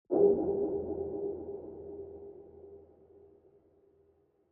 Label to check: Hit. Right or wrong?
Right